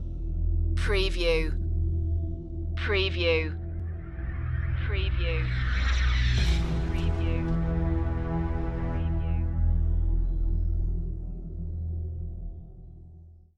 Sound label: tribal future stinger